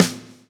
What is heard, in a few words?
lash, backbeat